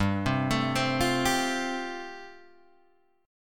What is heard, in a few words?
G 7th Suspended 2nd Suspended 4th, Guitar Open G